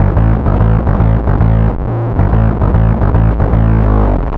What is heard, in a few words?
Bass, Distorted A; Bass X